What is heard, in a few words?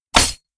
grappling hook; ragemap2024; grunt